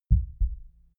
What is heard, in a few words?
heart beat double quiet